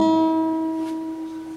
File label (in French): mi3 guitare